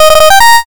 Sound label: fanfare